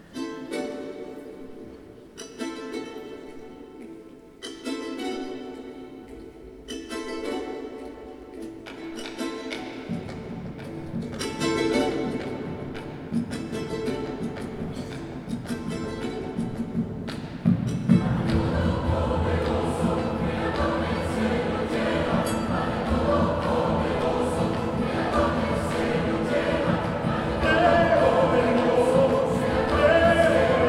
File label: Concert Misa Criolla Credo